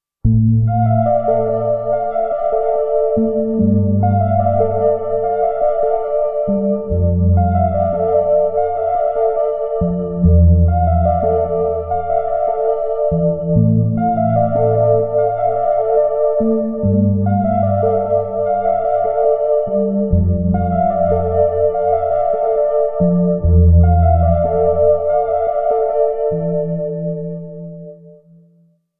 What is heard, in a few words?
Electric Piano